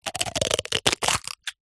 food egg shell crack break crush; zapsplat

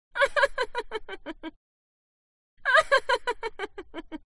HUMAN VOCAL Female Laugh; smartsound

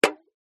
foley drink can cola impact liquid inside

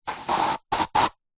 household deodorant spray

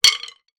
sport cricket stumps drop down on hard ground; zapsplat

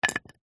wood chunk small light set down on concrete

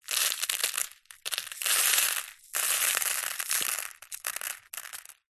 small stones drop sprinkle onto pile; foley

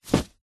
clothes sports shorts drop carpet; zapsplat

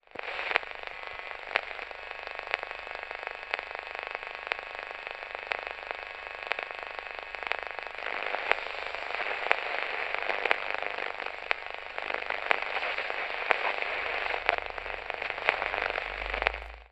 radio static lost signal

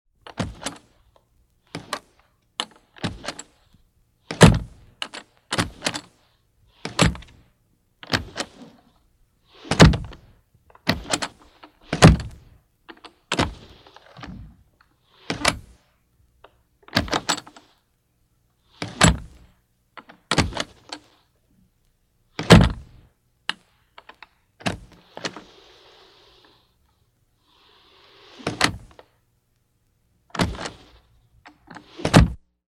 household cabinet latch open and close; sound effects one

